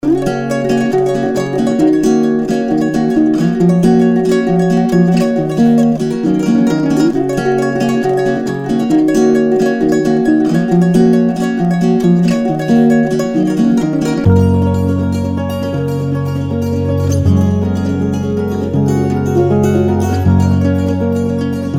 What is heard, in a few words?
Ukulele Folk